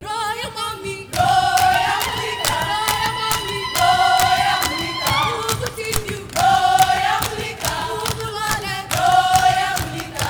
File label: AHO D4; Spectrasonics; Heart of Africa 2